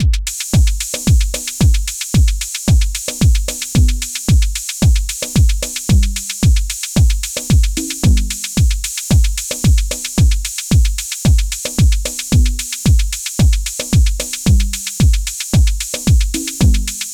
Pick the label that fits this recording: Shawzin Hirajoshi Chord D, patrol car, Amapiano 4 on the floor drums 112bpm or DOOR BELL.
Amapiano 4 on the floor drums 112bpm